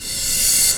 Cymbals Reverse Crash; Vengeance Samples

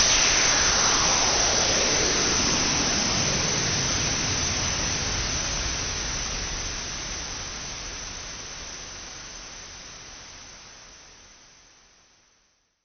Downsweep 140BPM; Sound FX; Vengeance Samples; D minor

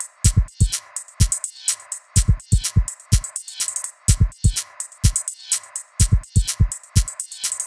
125BPM Kit; VFH1 125BPM Minimalsau Kit

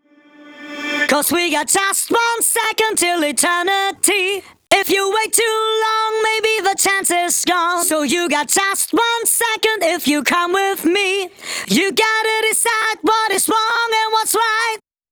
Male Vocals Chorus DRY, 140 BPM; Vengeance Samples; Song Kits; One Second

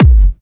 Bassdrums Clubby Kicks; Vengeance Samples

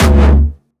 D2, Jumpstyle Kick; Jumpstyle Multis; vengeance essential club sounds-3; VEC3 Bassdrums

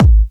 Bassdrums UK Trance Kicks

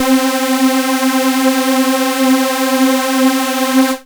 Synths C; vengeance essential club sounds-3

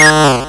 Arcade Riffs

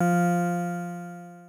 synth poly c2

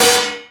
Synths B; Vengeance Samples

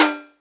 Percussion; Vengeance Samples

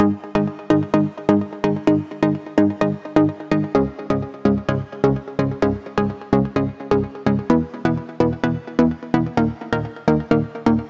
128BPM Chords Root G#; Zeta; Vengeance Samples; Zeta Kit

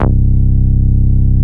Basses C; Vengeance Samples

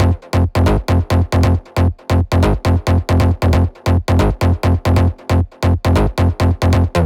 Special Sounds Root F 136 BPM; vengeance essential club sounds-3

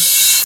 Open Hats, Cymbals

